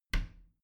bathroom cabinet creak close soft; objekte; tueren

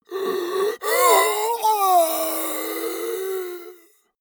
monster die; kreaturen

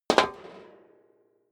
step high heels metal rattle single stair down; schritte

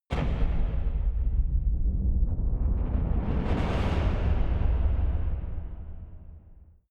massive metal rumble

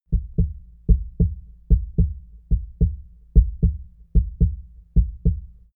heart beat sequence

